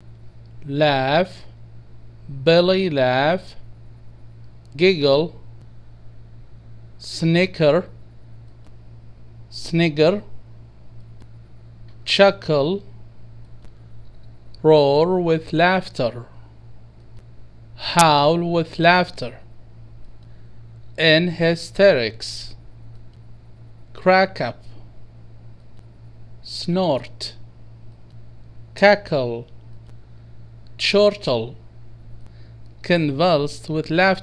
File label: laughs and smiles